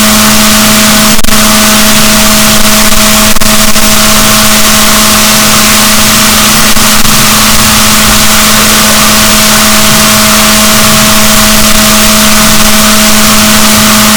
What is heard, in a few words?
rorquals negatif, boat